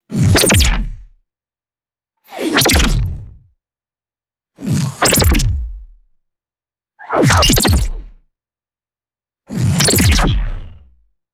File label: squelchy sci fi blaster weapon firing; guns